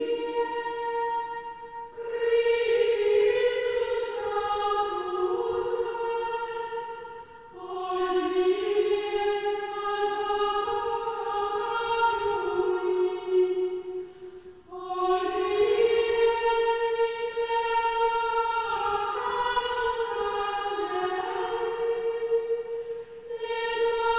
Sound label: Chant Antiphon; Traditional; Chester Processional